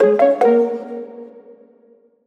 tonal oneshot F#; Pop; Slate; PASADENA Pop Sample Pack; Tonal One Shots

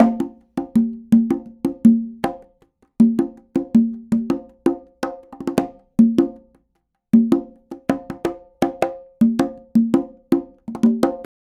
afroconga loop 80

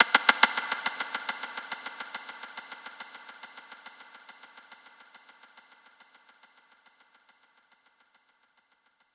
perc endless; Drum One Shots; SD MIDNIGHT Sample Pack; Slate

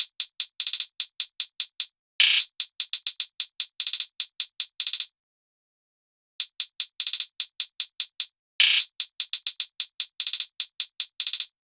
hat loop 75, Drums; Pop; Hat Loops; skycrest; Slate; SD PASADENA Pop Sample Pack

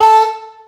Vocal one shot A; Slate Digital Omnivox Vocal Sample Pack

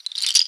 percussion one shot; Pop; Drums; SD PASADENA Pop Sample Pack; Slate

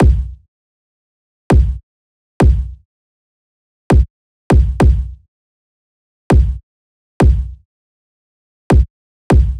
kick loop 100; Pop; Drums; Kick Loops; Slate; SD PASADENA Pop Sample Pack

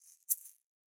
shaker single hit; Slate; SEISMIC Drum Sample Pack

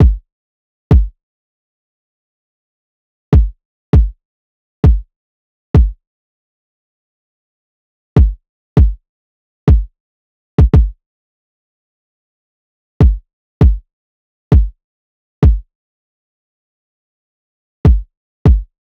kick 100, Kick Loops; Drum Sample Pack; SD SEISMIC; Slate

